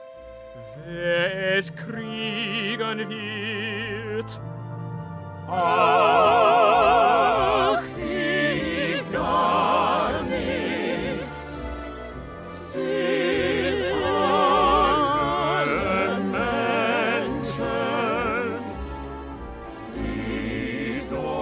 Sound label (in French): Tutti; E–mesures 127 à 140